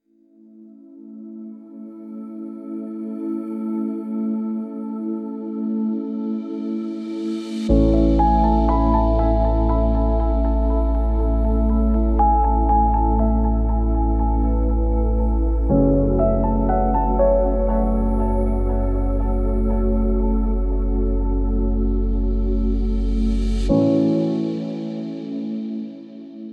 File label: serene synthscape